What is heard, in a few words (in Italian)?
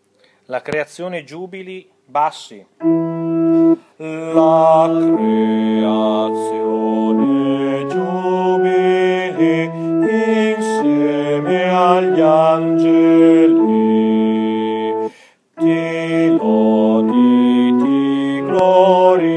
BASSI; La Creazione